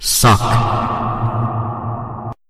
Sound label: SUCC; Titanfall2; Goofy ahh sounds; weapon gravitystar preexplo